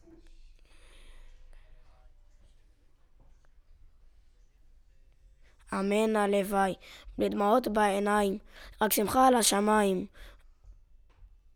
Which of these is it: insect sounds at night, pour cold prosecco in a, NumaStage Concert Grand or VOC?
VOC